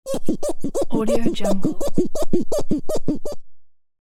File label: squeaky clean